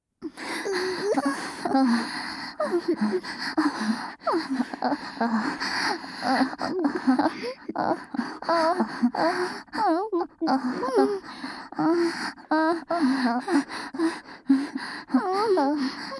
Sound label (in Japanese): 溺愛双子姉の本気耳元密着喘ぎループトラック, ASMR